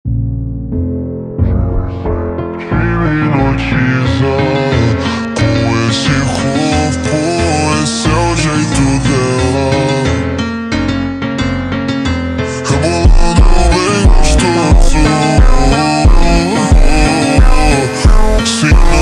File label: Montagem super Slowed